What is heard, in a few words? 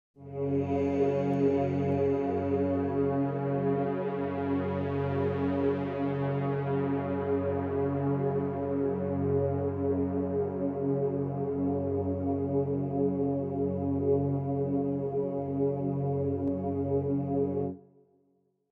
Pad Ethereal Voices; Syntheway